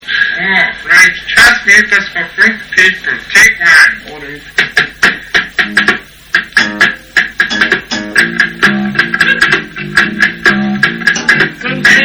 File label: jazz mucus for funk people